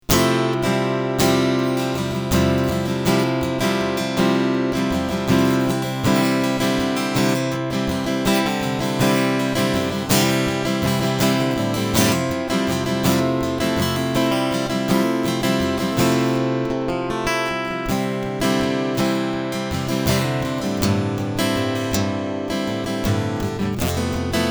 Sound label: Strumming Preamp